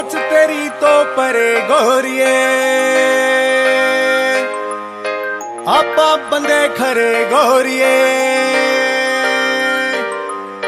punjabi ringtone

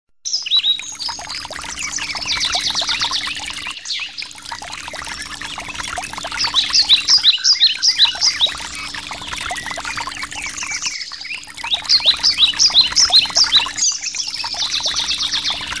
nature

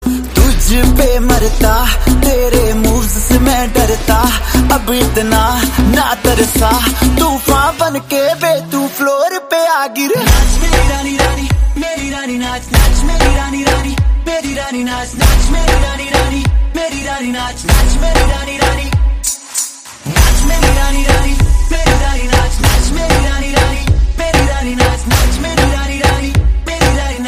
bollywood song ringtone

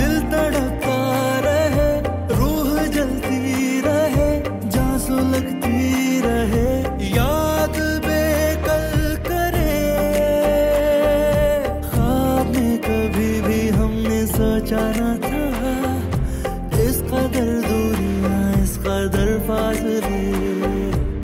pakistani drama ringtone

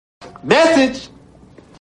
very short message tone ringtone sms

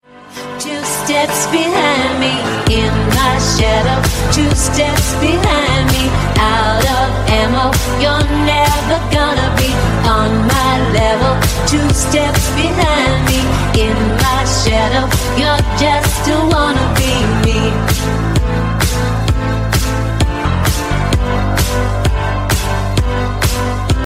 new hollywood song ringtone

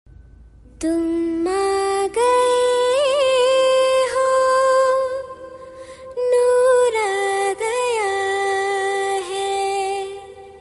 sweet song tone ringtone

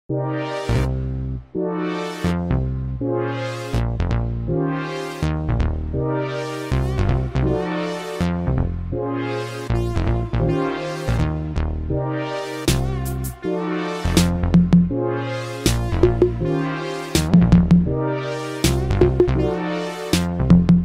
samsung galaxy s9 ringtone; orange

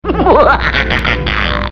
scary funny laugh call alert tone

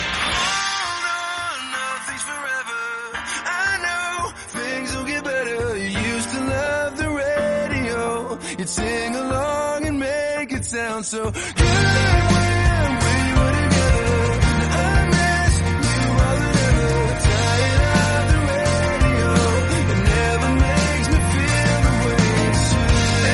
lovely call notification tone ringtone

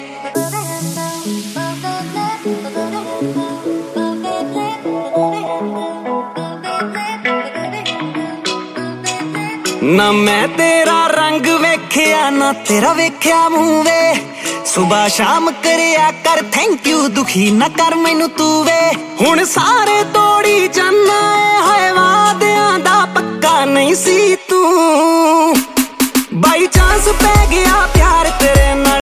punjabi ringtone

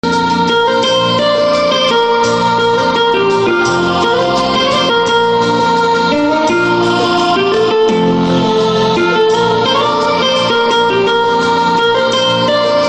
soft instrumental beautiful bollywood ringtone

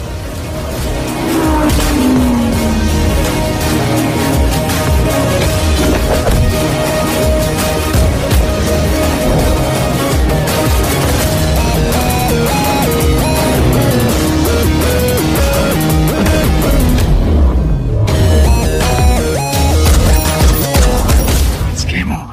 english theme music ringtone

